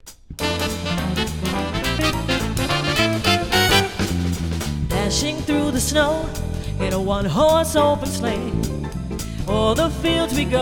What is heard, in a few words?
Jingle Bells